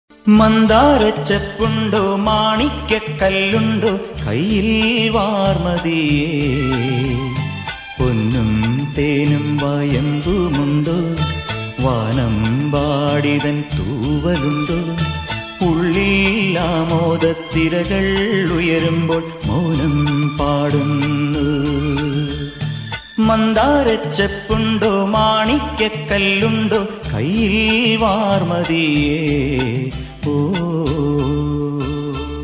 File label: malayalam song; malayalam love song